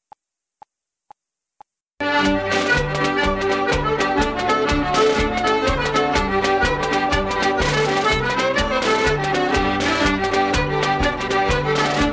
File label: Traditional Irish Jigs Medley G